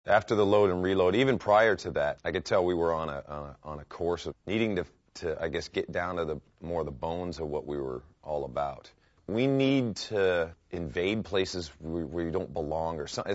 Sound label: metal